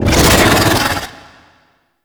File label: golden maw attack vocals; Gameplay; Warframe; Ambience; The War Within